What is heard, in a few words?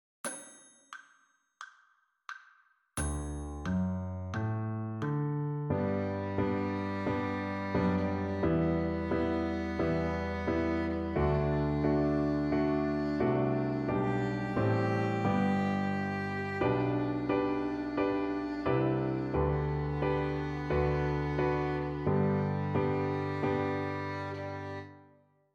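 G major arpeggio 1 octave, fiddle; Fiddle Time Scales 1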